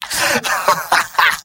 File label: laugh happy; mvm